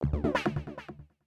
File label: Synth Hit D; Trance; Synth Hits; Zero-G Trance Inducer; 90 s Sample CDs